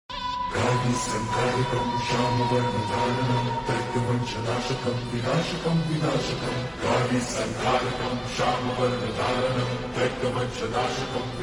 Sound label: Slowed Reverb; Maa